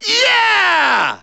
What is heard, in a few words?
SE GAME BREAK; maimai